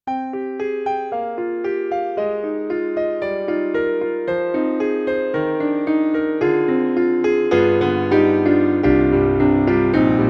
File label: Kurzweil MicroEnsemble ME-1 Stereo Grand